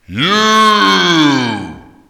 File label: slide